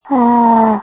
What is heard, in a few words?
Scream